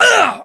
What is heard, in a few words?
melee attack; english